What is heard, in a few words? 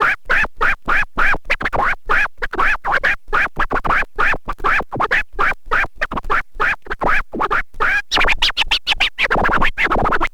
scratch long, Vinyl Attack, scratches; Masterbits; scratches mix